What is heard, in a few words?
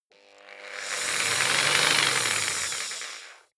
jigsaw engine slow short; maschinen; saege